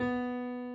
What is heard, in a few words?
key; keySounds